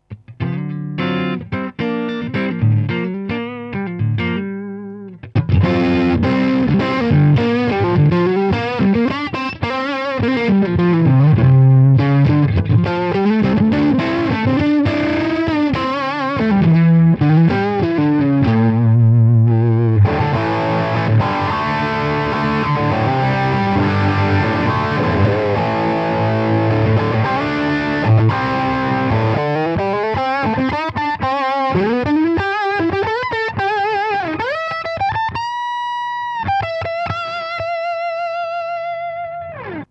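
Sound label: Pico Plexi Pedal Mixdown, guitar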